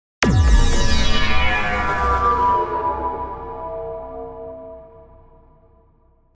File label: INTERGALACTIC IMPACT